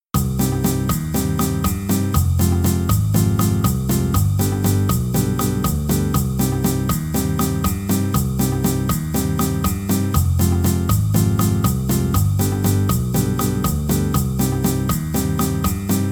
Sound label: Rumba latino drums